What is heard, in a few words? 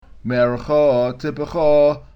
marka tipcha, cantilation